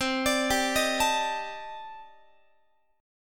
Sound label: C Minor 6th Add 9th C Guitar; Cm6add9 arpeggiated